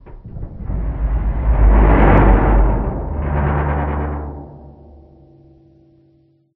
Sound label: metal low creaking ship structure; SFX; Environment; MONO; ProSoundCollection v1 3